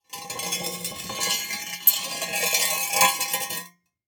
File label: Impact Exhaust Pipe Drag On Cement Long; Impacts